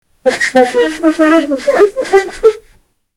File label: mouth